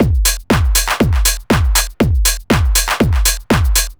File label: 4 floor beat, trance